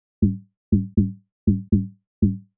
Tom Low; hydrogen

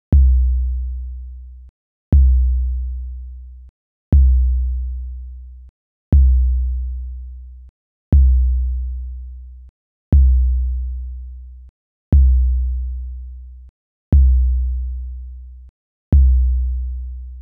kick soft; hydrogen

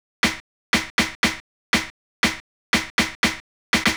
snare; hydrogen

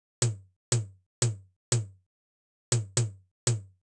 Tom TR707; hydrogen

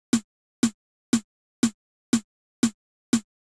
Snare; hydrogen

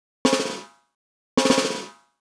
Snare Roll; hydrogen

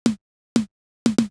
Snare; hydrogen

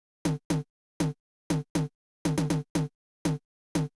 TR909 Dst Tom; hydrogen

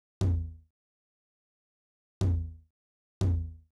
TR909 Tom; hydrogen

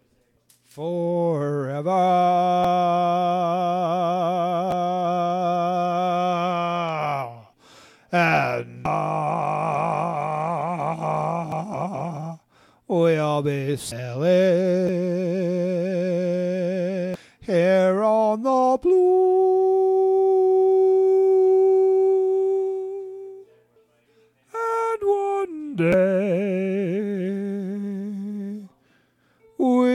sings, ukelele

